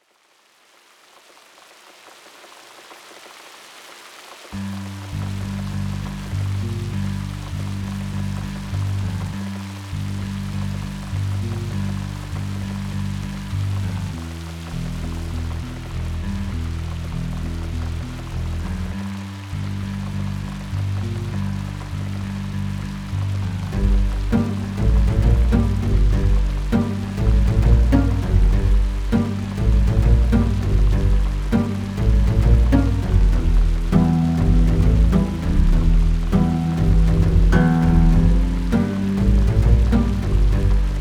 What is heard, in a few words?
wet jungle (rain)